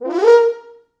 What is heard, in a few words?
4 FrHorns FXMOD, A#3; East West - Quantum Leap Horns Sax; 90's Sample CDs